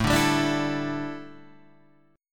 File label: A 7th Suspended 4th, A, down, Guitar, Half Step Down; A7sus4